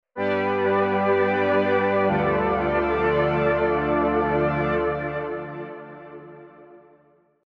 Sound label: pad